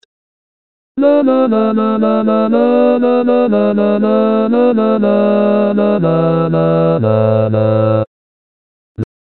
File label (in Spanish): introducción 2ª bajo